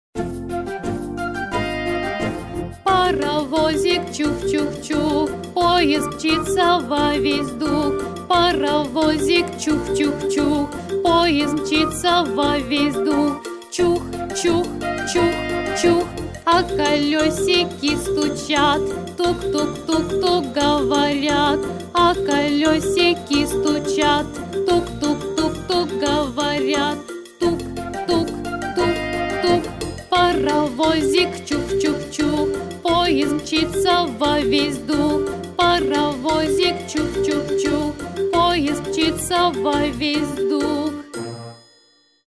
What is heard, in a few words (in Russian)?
Паравозик чух-чух-чух; Звуковое лото